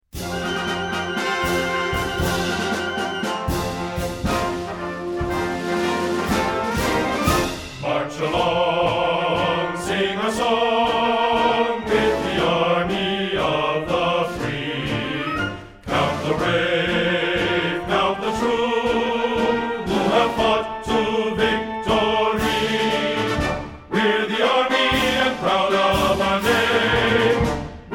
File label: Band and Chorus; US Army